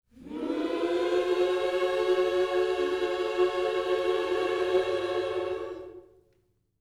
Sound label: MINOR, Gospel; Vocal Planet Vol1 Gospel